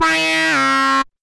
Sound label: Beat